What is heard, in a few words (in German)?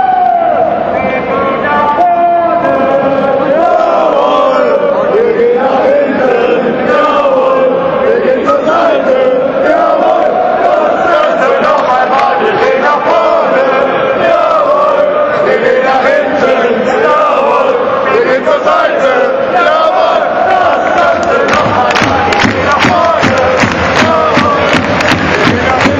gesang; Sinnlos; Stadion